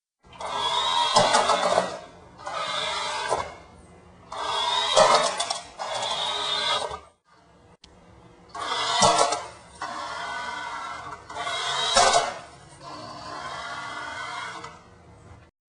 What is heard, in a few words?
spur brake test